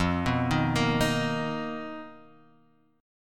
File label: B Major 7th F, chord; Bmaj7/F arpeggiated; JGuitar